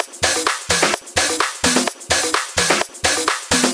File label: House Loop, 128 BPM; Vengeance Samples